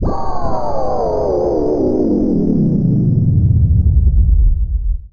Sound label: SPACE FX 140; SpaceSounds